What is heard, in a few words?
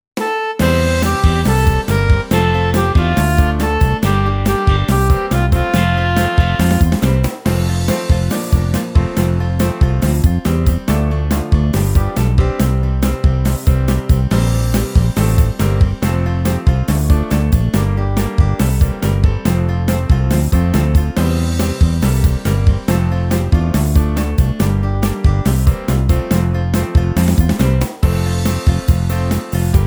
Karaoke